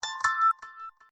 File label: music box bell strike; DailySounds